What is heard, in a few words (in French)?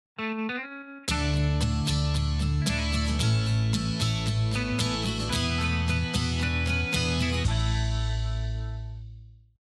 POP MELODIE FIN